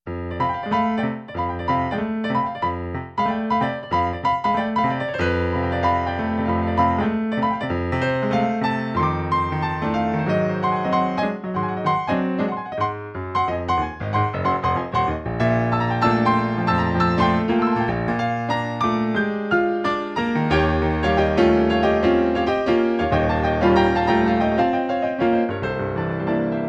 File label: boogie Woogie